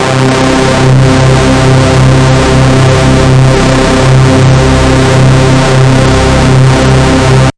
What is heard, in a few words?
the force, synth